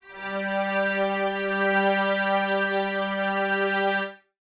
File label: String Ensemble G3; Pro Sounds Sample Collection